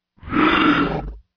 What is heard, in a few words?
animal roar